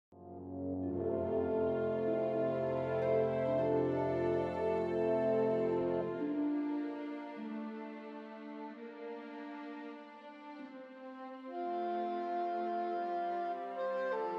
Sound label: slow thoughtful